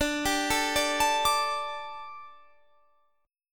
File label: D Suspended 4th D, down, Guitar Standard; Dsus4 arpeggiated; JGuitar